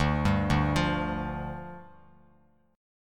D-Minor Double Flat 5th, D, Bass Standard; Dmbb5; arpeggiated; JGuitar

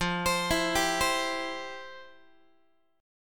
F Major 7th Suspended 2nd, F, down, Guitar; arpeggiated; JGuitar

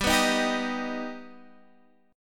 B Augmented G

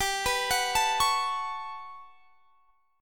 G Major Sharp 11th G down, chord; Listen to GM#11 arpeggiated

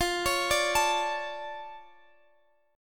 F Augmented 6th F, chord

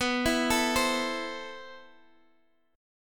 C 6th B, chord; arpeggiated

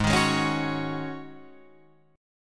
G sharp 7th Suspended 4th G sharp, Guitar Open F; G#7sus4; 8,10,8,8,9,8; strummed

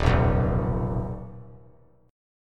D sharp Suspended 2nd Sharp 5th D sharp; strummed; JGuitar

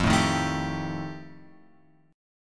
F 9th F, chord; F9 Chord {1 0 1 2 X 3} strummed

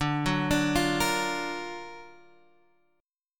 F sharp Augmented Major 7th, D

